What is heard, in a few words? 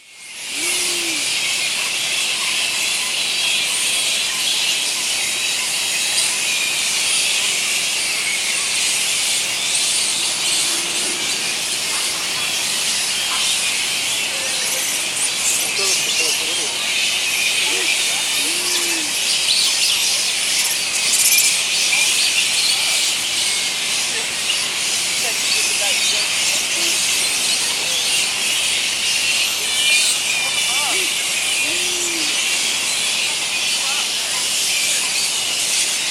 Bats